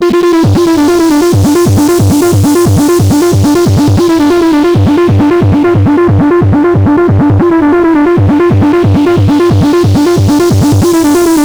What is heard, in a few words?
BURN FILT, Synthy Tonal; Distored Reality